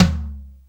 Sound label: TOM LO; Back In Time Records; Big Bang